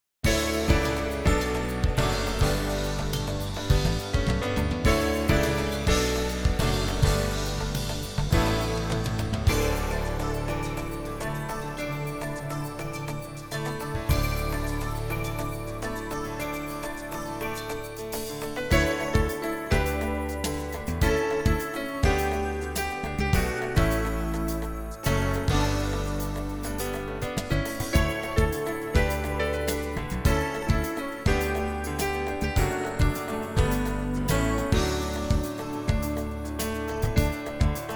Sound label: bgm